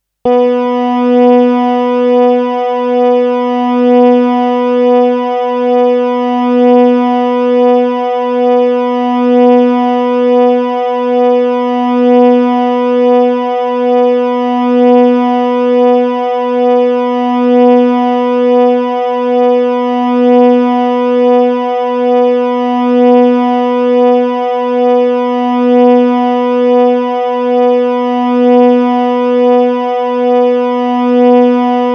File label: Second String B; synthesised notes, each about a minute long, for you to check your guitar tuning